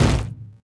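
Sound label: metal hit hollow medium; objects; Star Wars Obi-Wan; XBOX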